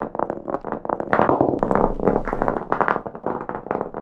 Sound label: Roll Lp